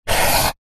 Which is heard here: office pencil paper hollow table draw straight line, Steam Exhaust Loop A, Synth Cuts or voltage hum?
office pencil paper hollow table draw straight line